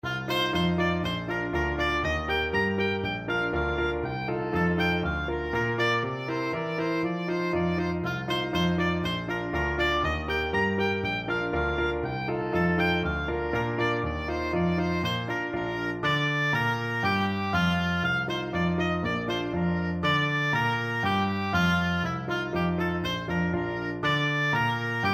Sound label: offenbach can can, oboe